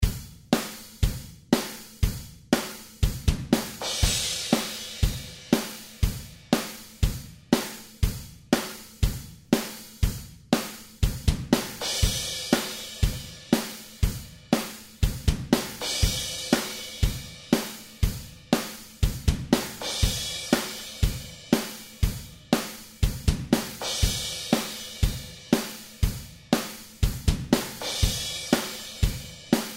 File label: Dm7 Drums